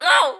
Pecheneg Archer Damage

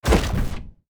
Rocket Launch

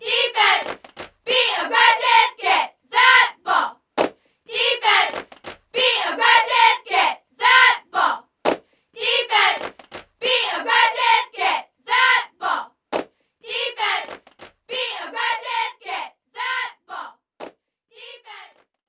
Sfx Basketball; Kinect Sports Season Two; Xbox 360 gamerip

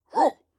Attack; Ruins Sentry

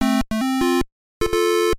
Round Won; Karateka; Atari 7800

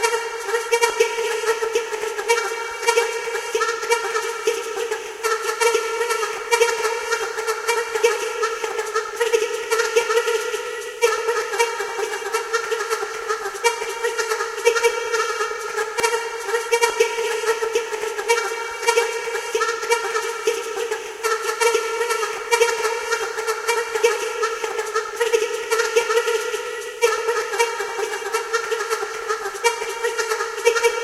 Music Loop; Infinite Hole; Music Loop 10

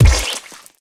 Bounce

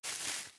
Thick grass

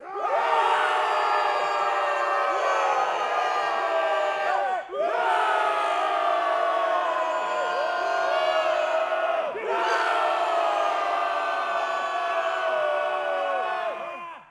Swimming Crowd Cheer High; MiniGames; Valthirian Arc Hero School Story 2

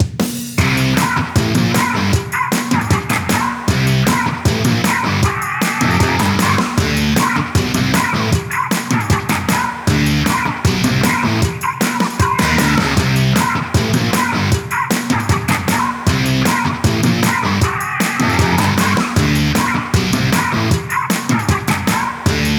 Battle Victory

